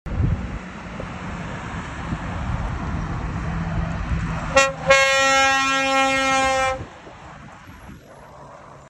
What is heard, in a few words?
trailer truck horn